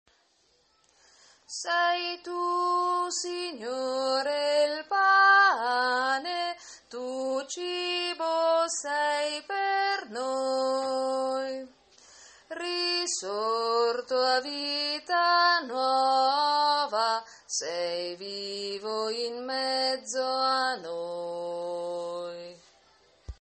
bassi

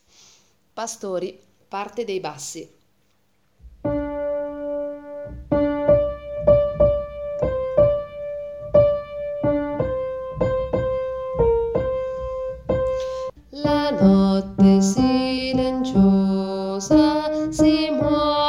pastori bassi

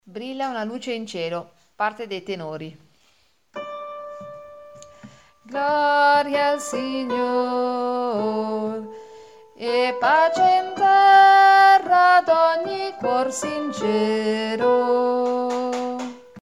brilla luce cielo tenori

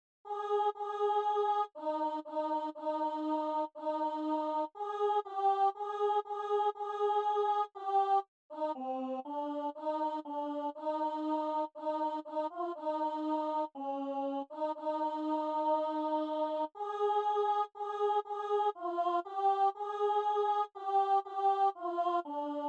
contralti; Adeste fideles

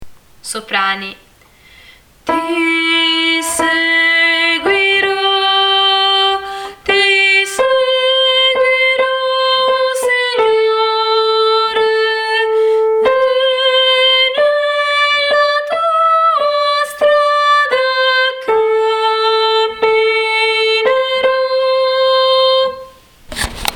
Ti seguiro Soprani

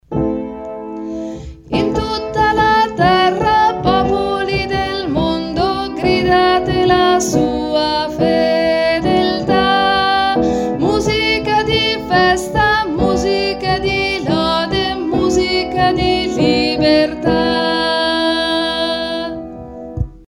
basso

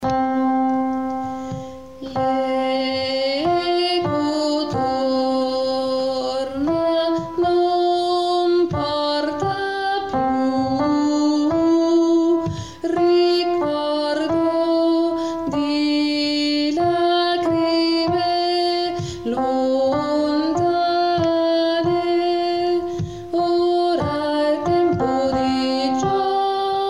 contralti

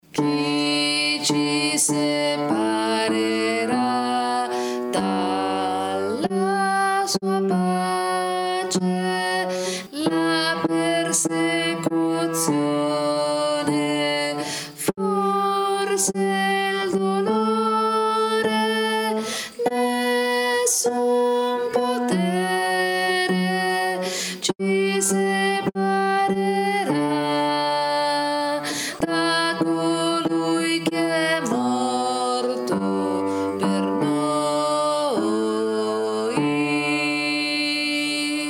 bassi

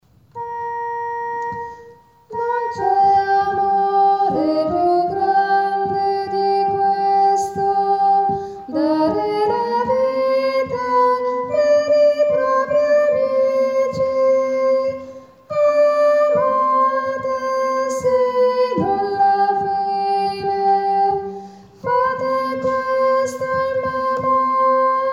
li amo fino alla fine tenori

